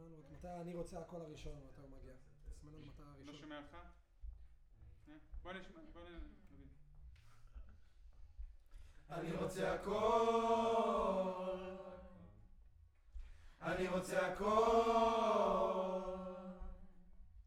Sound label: Choir; Decca LR